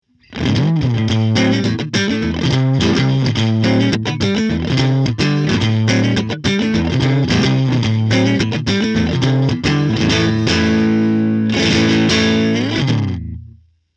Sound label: US double vib; Helixシリーズのマルチエフェクター; helix amp model